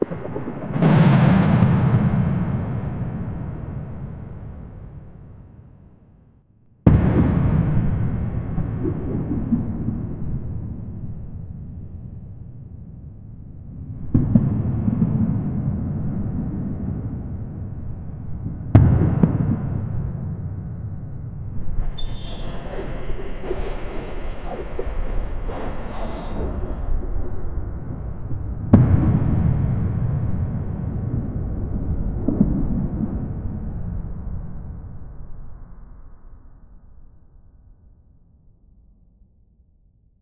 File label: battlefiel ambience with